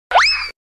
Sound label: cartoon falling sound